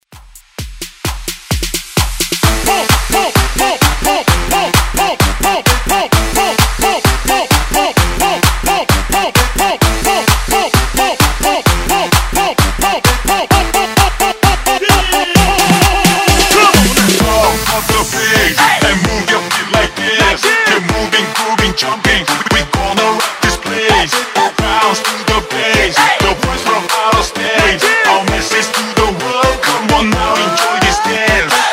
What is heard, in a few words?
Dance Redrum